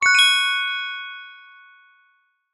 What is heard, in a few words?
fantasy magic chime ping wand fairy godmother